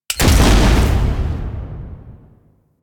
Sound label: Shotgun Shot; Weapons; DE; Warframe; Tenno; Pod Shotgun